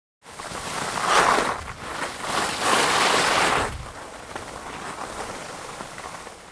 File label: Ski slalom down hill pass by close fast